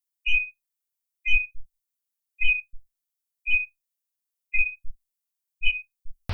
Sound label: Beep; Winmine; WEP